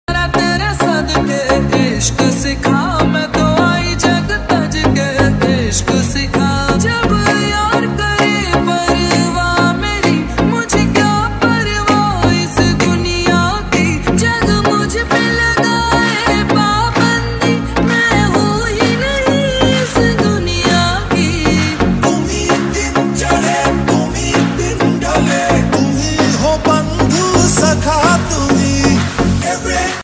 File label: dj remix hindi ringtone